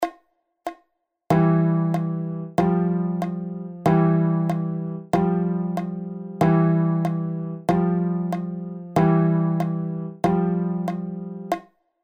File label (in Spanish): CLAVE DE FA